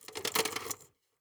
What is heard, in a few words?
belt, m249; weapons; Urban-Coalition; Anarchy; arccw ue